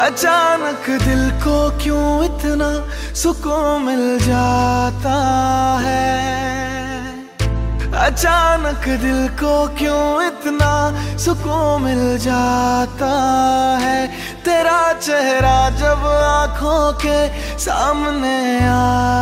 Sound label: Romantic Hindi Song Ringtone